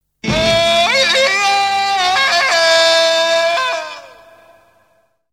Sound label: Tarzan